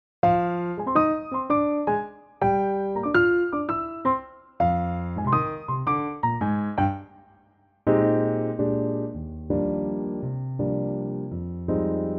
piano

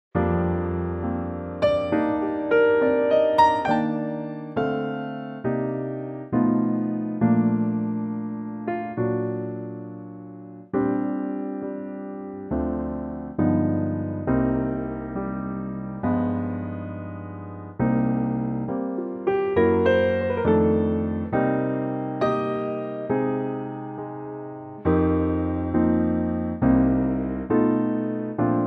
Bb piano trak

